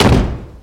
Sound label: beep block door; tf2ware ultimate